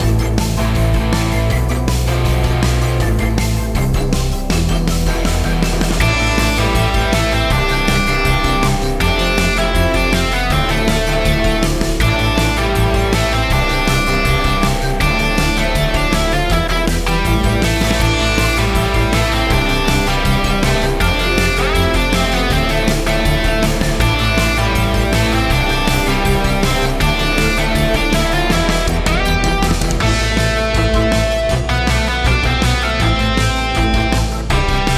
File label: bpm160